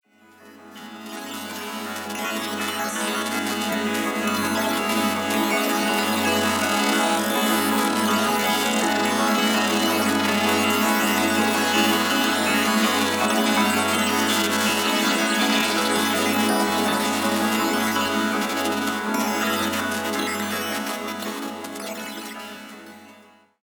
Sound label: Pad F#; Supercomputer